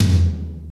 POWER TOM LO, MPC-60 DRUMS; Greytsounds VOL-1

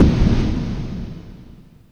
POWDER, PERC; NorthStar - The Wizard VOL-2; TSAR PERC

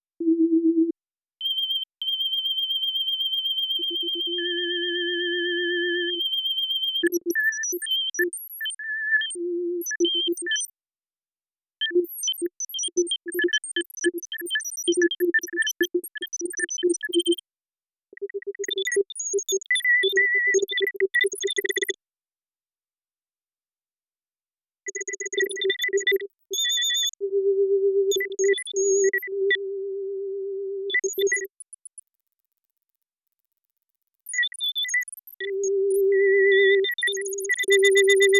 harmor glitch

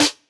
Snare, jungle breaks drum sounds

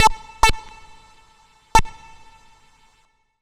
MR SYN 2 R, Roots Funk; USB Soundscan vol.41; AKAI

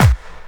KIT, Dance Mega Drums; Best Service

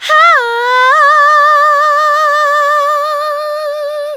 HAHANHAAA, Funky Vocals, ADLIB WOM; USB Soundscan vol.18

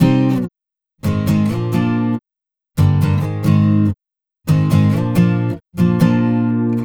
Acoustic Pop Guitar 140BPM Cmin; Vengeance - Essential Clubsounds Vol.4

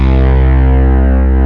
BASS, DANCE

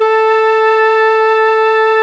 wood A4, orch; AKAI S-Series CD-ROM Sound Library VOL-2

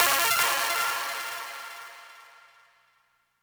Stabs, Elektron MachineDrum SPS1 MKII

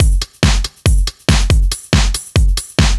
Kit 7 Odd Sig 140; off the grid

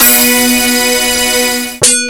multi techno; Rubber Chicken Software Sample Bank

